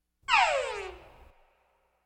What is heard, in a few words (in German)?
Science-Fiction Sternschnuppe